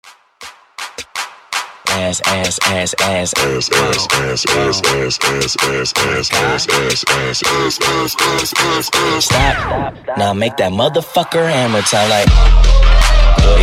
HipHop Wordplay Segue 80 91 BPM

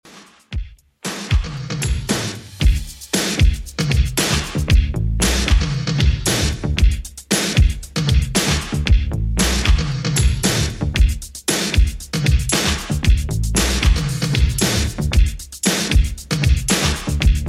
80s 115bpm; Redrum

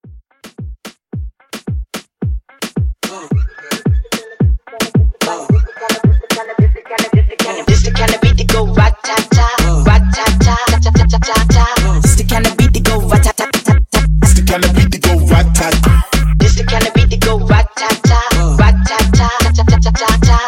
Latin Moomba 110; Club Hype

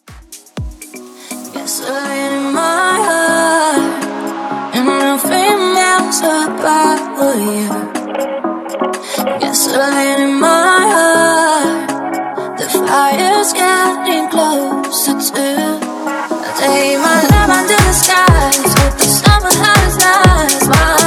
Pop Club Dance 122 BPM

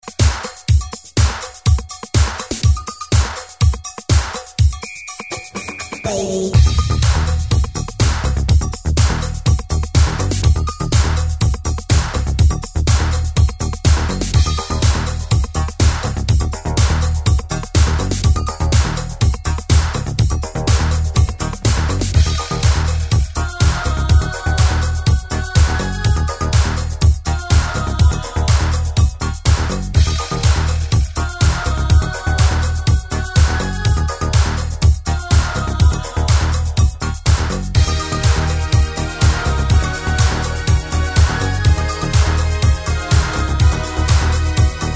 80s Extended 123 Bpm

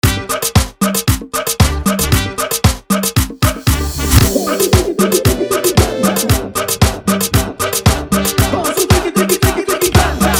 Cumbia hype

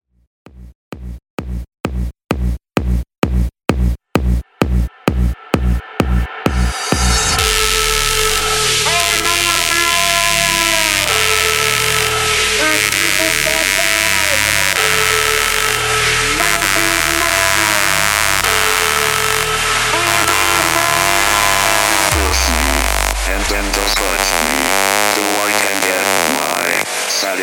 Epic Mixshow 130 Bpm; djyan

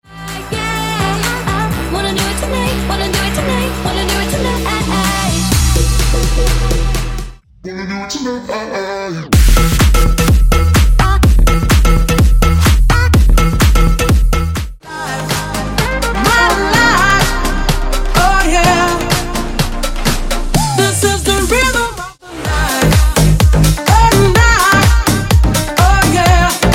EDM Megamix

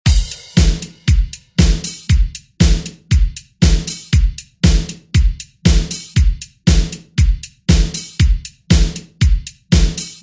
ReDrum; DJ Mhark

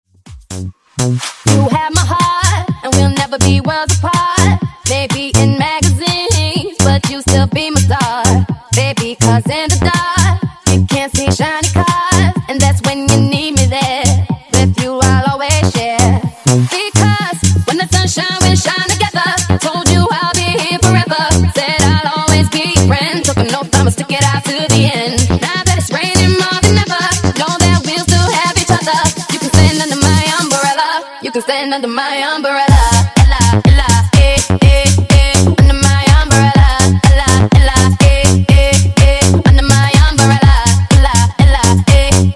Funk Bootleg Clean 124 Bpm

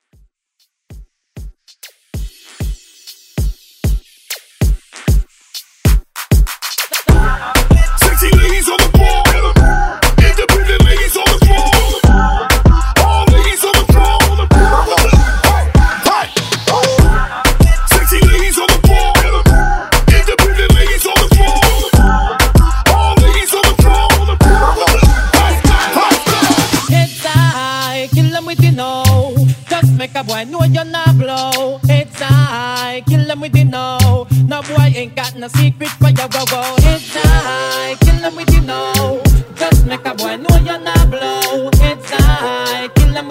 Moombah

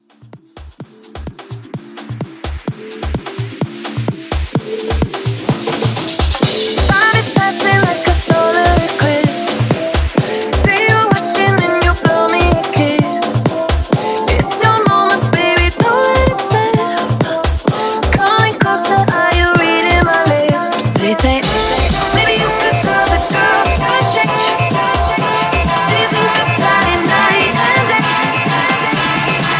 Triple Mashup Bootleg

